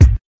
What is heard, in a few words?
kick shortstop low; single hits